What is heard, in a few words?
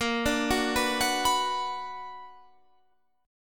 B Minor Bb; Bm/Bb; arpeggiated